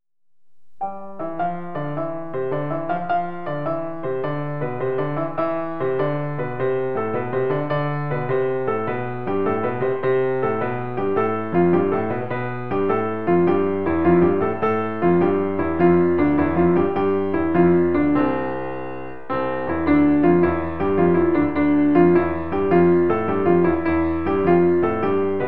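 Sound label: Keyboard Pattern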